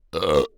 burp; OPENAIR; Anechoic

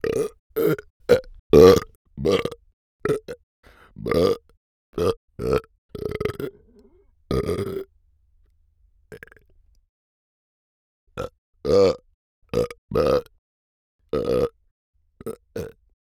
burp, Anechoic; OPENAIR

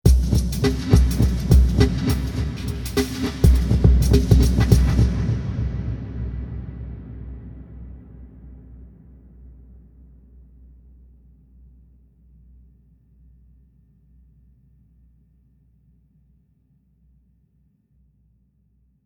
drums, cathedral; st pauls

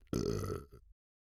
burp; OPENAIR; Anechoic

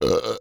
burp; OPENAIR; Anechoic

